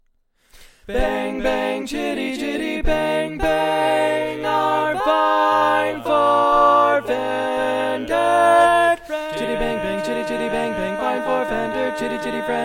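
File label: Baritone, barbershop tags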